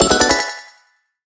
start

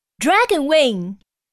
Win

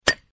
knocking

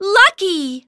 card lucky

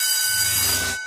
total win star

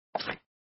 fold; Tongits

